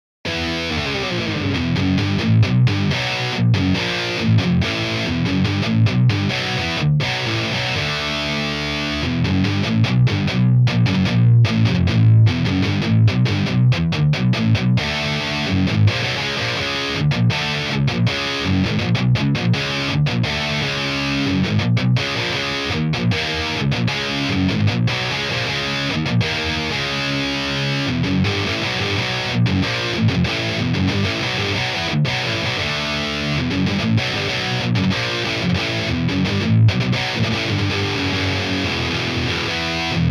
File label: riff